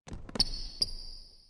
摩擦; nba

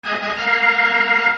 风琴上行; nba

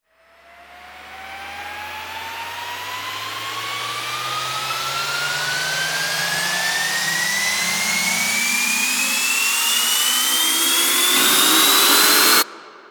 上升音效; 战中; nba

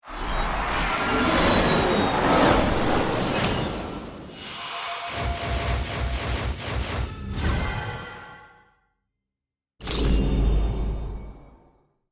抽卡音效; nba; 球探系统

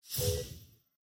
功能键展开音效; nba; 战中

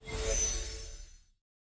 季后赛 梦之队挑战赛页面; nba

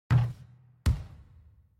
未命中, nba; 战中

